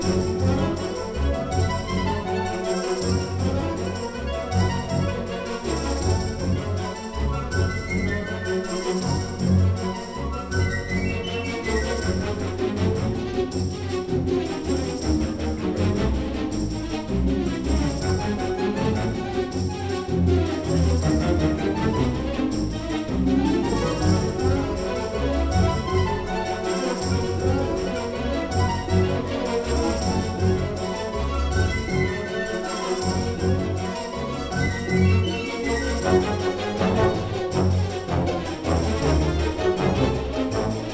Crowd Traffic Jam Theme 160bpm Loop; asterix obelix slap them all 2

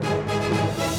Music Fight Theme Hard 120bpm Start; asterix obelix slap them all 2; Romans

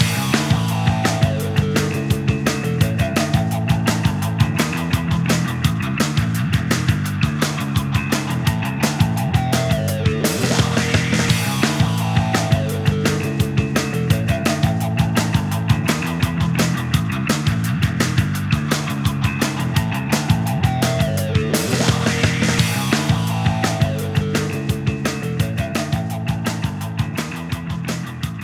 Jam Session; Splattack; Splatoon

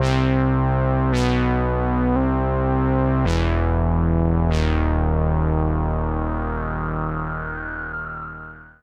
LOSE

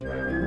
Music Dialogue Inlove Start 64bpm

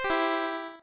hero chord; Warlock's Tower

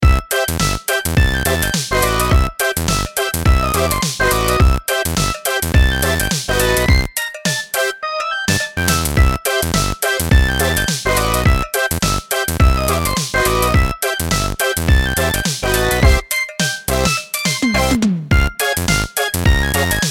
Balloon game Ingame; Animal Up; switch windows

